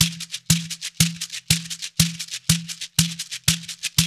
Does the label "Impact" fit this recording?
No